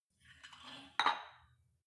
weight rack insert weight soft

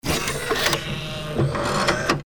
electric door

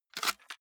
cassette out of walkman

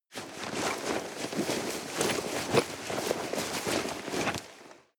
nylon leather hard long

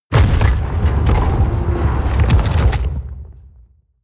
mechanical lever stone large up

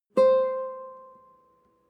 nylon guitar single note high

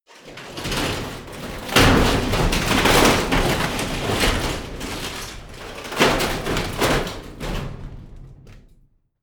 metal crash medium

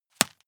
juggle catch hard

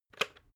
water boiler switch on

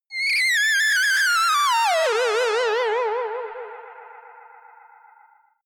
ululation ufo

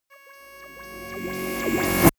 rise buzz medium

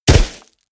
body fall snow hard

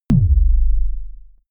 sub kick noise

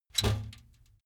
trash basket metal hit rattle

